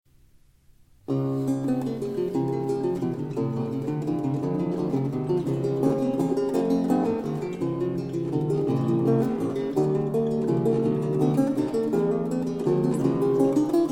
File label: Allegro in E flat Major; Bach; BWV 998